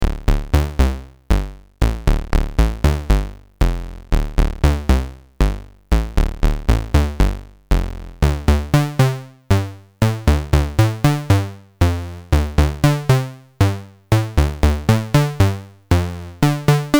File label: WMD VCA Exp Sat